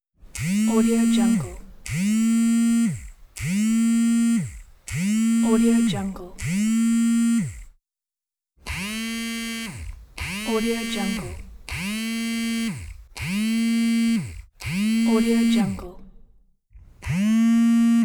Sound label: phone vibrate; sounddivision